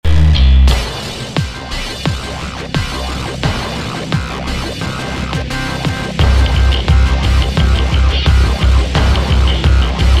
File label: Dark Energy, epic action game OST; epic action levels game ost vol 1